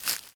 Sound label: leaves step; Golden Crayon Sounds